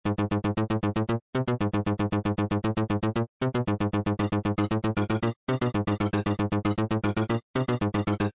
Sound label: dizzy